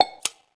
cam snap; LG; Optimus L90 D415; camera

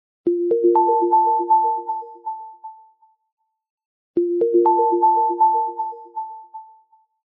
Twinkle Above, alarms; HMD; Pulse

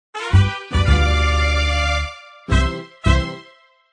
Mariachi Ending

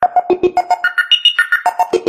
roboto, notifications; Sony; Xperia Z3

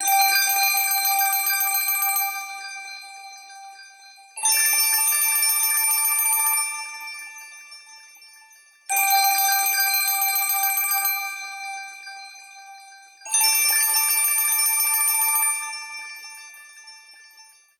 mystical chimes; ringtones; Google; Google Sounds; Play It Loud

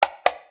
knock, notifications; ZTE nubia Z20

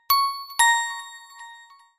sms; Pantech Breakout

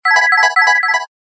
Cricket Tone; Samsung SCH-M490 T Omnia; Ringtones

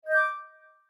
Orchestral removal; Nokia E52; Orchestral MMC removal

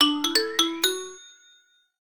Schedule, notifications; LG G3 Screen F490L

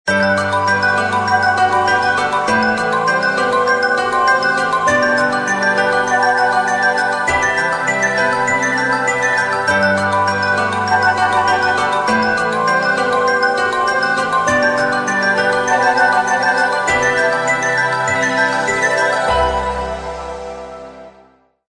Morning Call; KM380

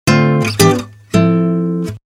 Acc Guit; PlusFourSix

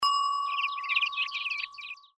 Clock Bell; LG; S367 Alt

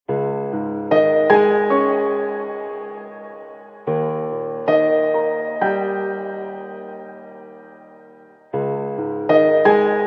wakeup; Nokia; 8800 Sirocco Edition

